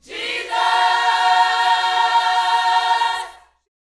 YOUTH CH PHRASE, Vocal Planet Gospel

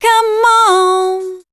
voice spectral, ly short; Best Service; Voice Spectral II

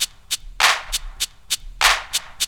LOOP; E-Lab; X Static Goldmine 2; akai

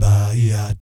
BAYEEAT, Vocal Planet Jazz Fx; Spectrasonics

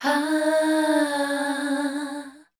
#D, Voice Spectral; MELODIC; Best Service; Voice Spectral II

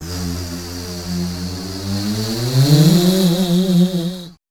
WEIRDOS, Voice Spectral

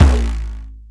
R8 PRC; E-Lab; X Static Goldmine 1

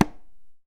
CONGA

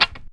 GTR DUB; FX GTR; E-Lab; X Static Goldmine 2; akai

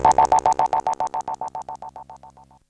FX

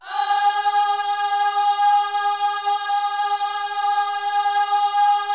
OHS G#4, Gospel; Spectrasonics; Vocal Planet Vol.1 Gospel; akai

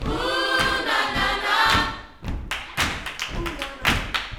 Vocal Gospel PHRASE

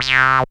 SYN TON; E-Lab; X Static Goldmine 2; akai

